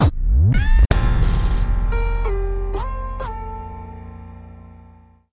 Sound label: 4 note Jingle